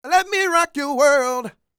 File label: SING, Vocal Planet R&B & Dance; ILIO